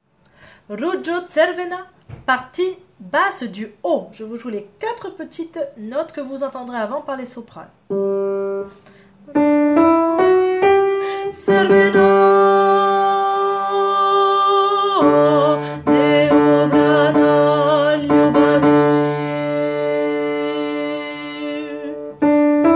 basse haut; Ružo cvrena

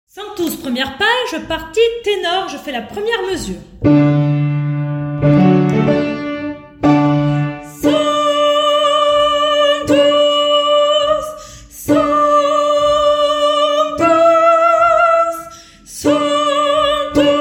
partie tenor; Sanctus début

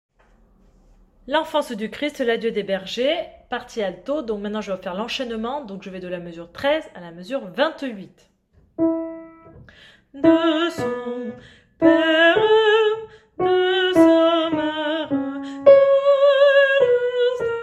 partie alto

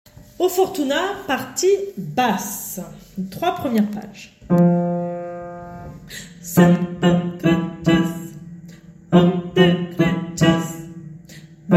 basses; Oh fortuna 3 premieres pages

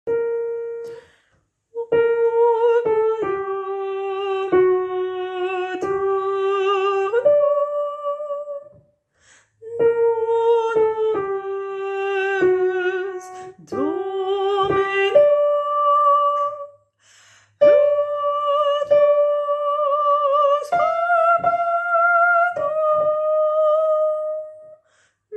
Soprane; Graduale

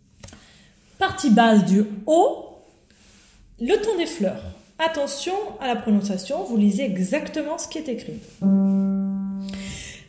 basse du haut

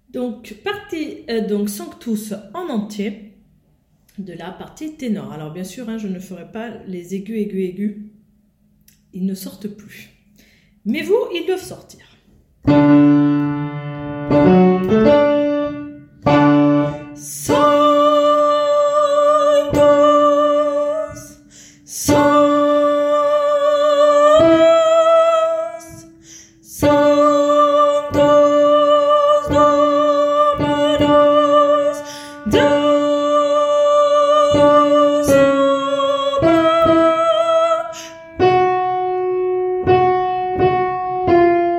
partie tenor; Sanctus en entier

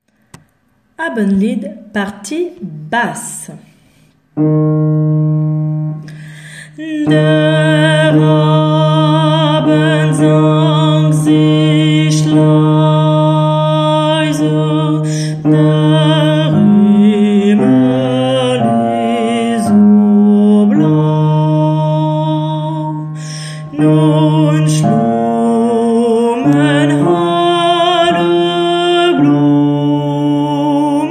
Basse; Abendlied

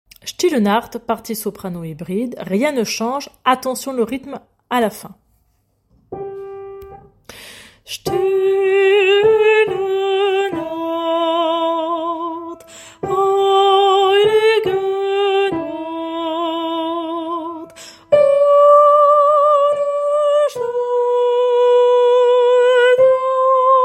Silent Night partie soprano hybrides, choeur; Still nacht

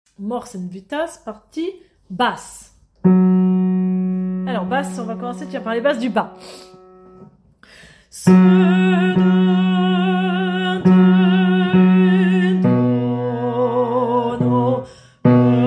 partie basse du bas; Mors et vita

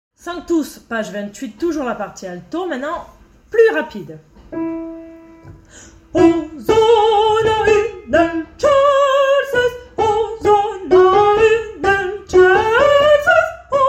partie alto rapide; Sanctus p28

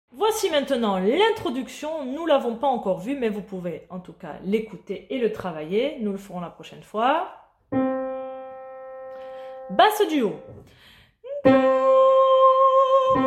basse du haut

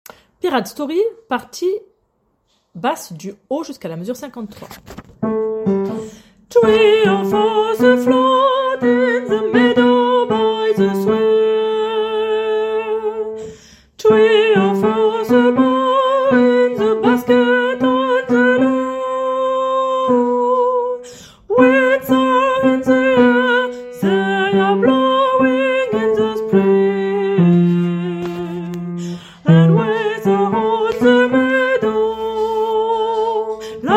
basse du haut

